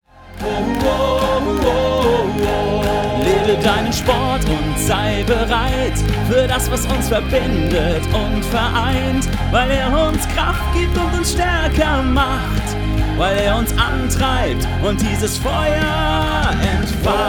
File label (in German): Pop Schlager Songschnipsel; Mysoundbranding